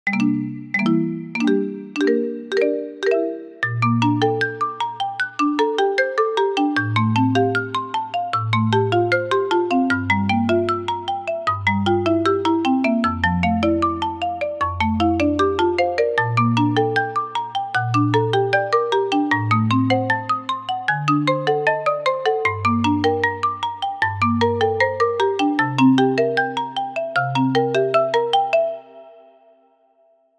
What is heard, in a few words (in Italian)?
Marimba suoneria